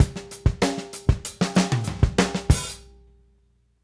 Drums Ending, 70s Analog Funk; Sonic Foundry (Sony Creative Software); What it Is! 70s Analog Funk

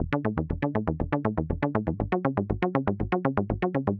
SH101 Arp (120BPM), retro house

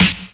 SD, snares; EastWest; Dance Mega Hip Hop Electro

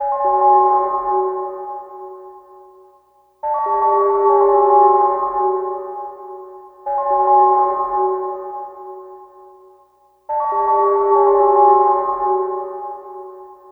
Spooky Keys; Electronic Point Blank

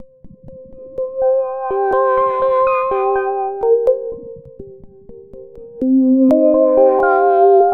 sum ambience C 124, synth loop; Synth Loops; Zero-G; Soundclash Nu Dance Electro; Acidized; 124bpm

